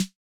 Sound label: snare classic